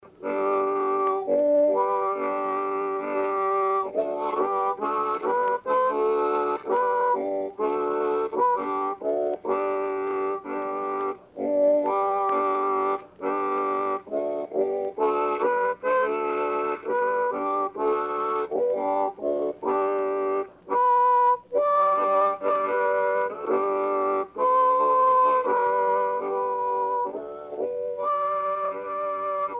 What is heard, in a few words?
harmonica